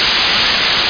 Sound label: ski; NewSurfs